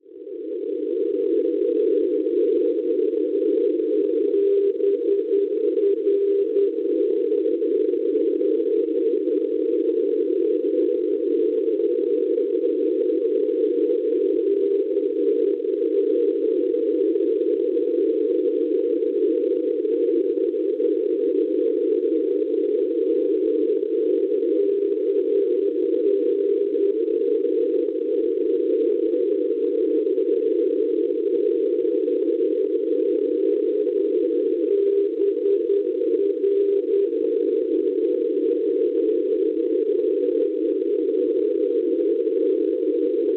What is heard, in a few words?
NDB Lione Francia 388 kHz; BR; Schwarzsee